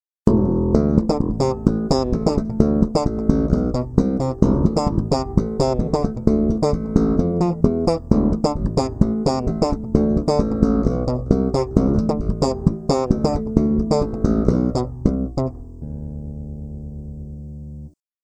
Bridge, bassblaster; szeregowo

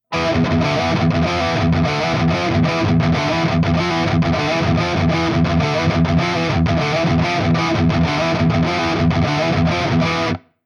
High Gain; Revv Generator

